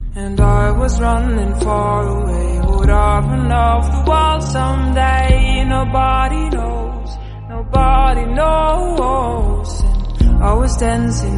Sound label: female english song tone ringtone; samsung ringtones